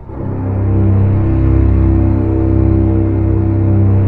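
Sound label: strings C0 PP L; Sound On Sound - Peter Siedleczek's Orchestra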